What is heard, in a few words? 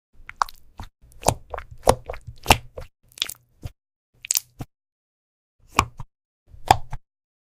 Keyboard asmr; اسمر کیبورد با هوش مصنوعی